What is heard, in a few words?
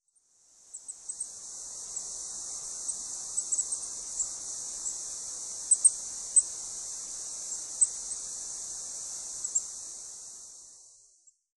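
Goldcrest, maruyama; koe; kikuitadaki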